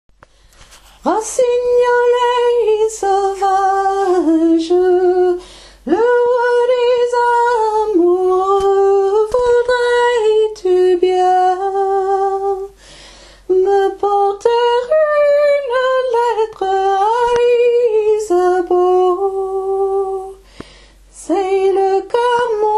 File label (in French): soprane; rossignolet